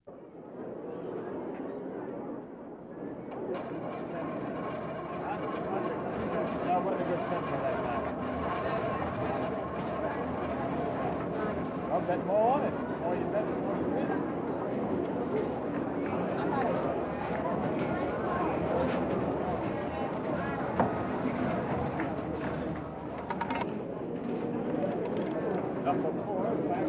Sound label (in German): roulette - menschenmenge im hintergrund; Gluecksspiel